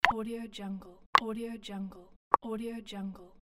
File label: button clicks; oc music